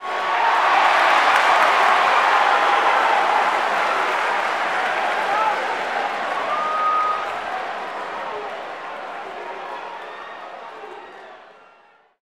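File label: Basketball Crowd Cheer; SFX Kit; Crowds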